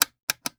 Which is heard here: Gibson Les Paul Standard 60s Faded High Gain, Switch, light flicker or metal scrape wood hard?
Switch